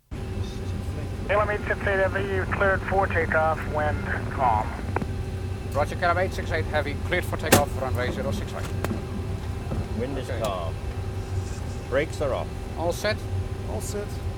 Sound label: DC-10